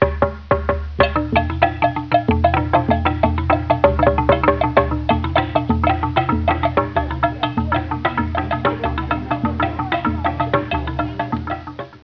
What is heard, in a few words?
balafon, Africa musica; Etnologia